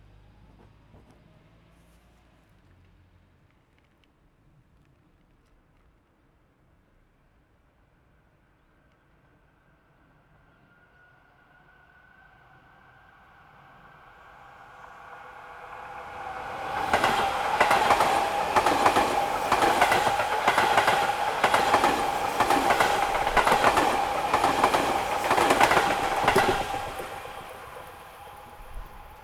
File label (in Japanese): 上り電車通過。; H2essential MS内蔵マイク指向性120°＋ZOOM ヘアリーウィンドスクリーン WSH-2e; 録音したファイルを、本体でノーマライズしました。